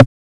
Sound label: 909 rim